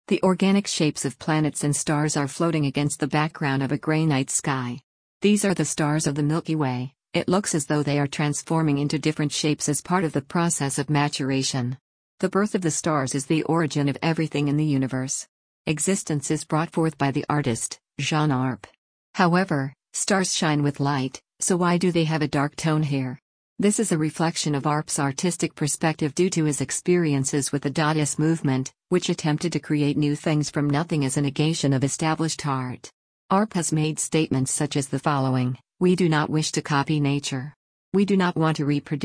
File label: Arp